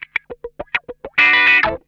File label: OUCH, Funk Guitar; Zero G - Funk Guitar